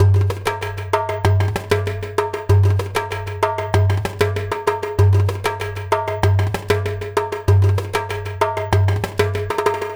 Tactile Impulse, Processed Drumkits

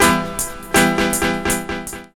echo, ragga samples; AMG - Now CD-ROM (Roland); Soun'Bwoy!

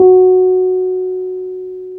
PNO, Wurly; Roland LCDP10 Keys of the 60s and 70s 2

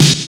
SNR DELAY, Snares; Roland - Rhythm Section; Snares 5

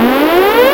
alarm; Ueberschall; Techno Trance Essentials

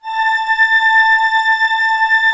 orchestra A5 PP; Sound On Sound - Peter Siedleczek's Orchestra

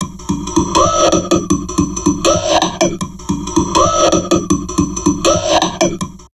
TALK, Drum Loops Crazy Processed

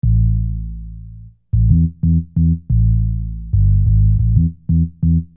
Sine Bass

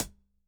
HIHAT TOP, ELECTRO KIT

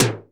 TEK TOM LO, TECHNO; Drums In Your FACE!

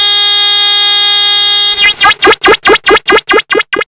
TELEPORT, Hard & Loud Techno; USB Soundscan vol.01; AKAI

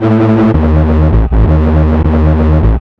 Hardhedz Bass; Sonic Foundry (Sony Creative Software); Knutrix Drum & Bass

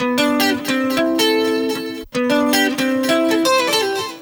TWNSHIP, S.AFR.GTR; Spectrasonics; Heart of Africa 2

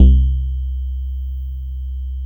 GLASSY C2, BASSES; Best Service Alpha Dance vol.2

